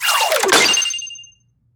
web spell

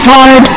extreme fard

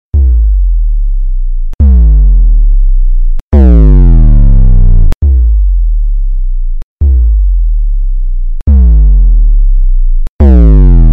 bass thing

